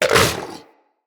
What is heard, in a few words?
Vocal Tranquillized Grunt, Hunting Critters; Characters; Vulpaphyla